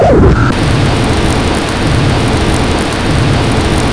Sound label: wind up, weapons; PC Gamer IT CD 46; HALFLIFE; Gateway; egon